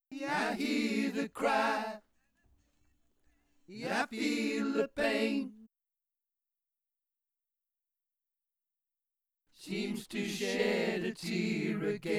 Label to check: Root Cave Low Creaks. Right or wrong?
Wrong